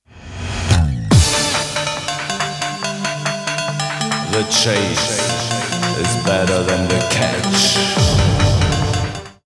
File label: music